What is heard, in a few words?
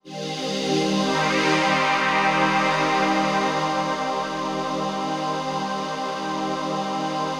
CHRD PAD; Best Service; XX Large Pads; Sample CDs